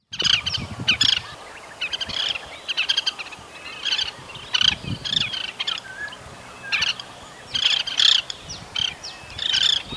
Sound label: Oriental Pratincole calls South Perak Malaysia